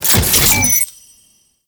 Moa Hack Finish; Warframe; The New War; Characters; Veso

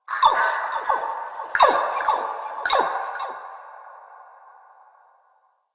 Swamp Mid Creature; Ambience; Midrath Island

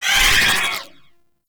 Void Dagger Proj By; Warframe; Zariman Dagger; Void Mode

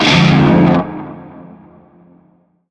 Shawzin Min Chord; Chord B; Music; Narmer; Warframe

